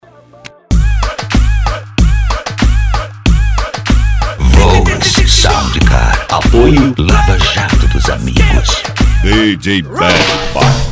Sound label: sound car reggaeton; cd volks sound car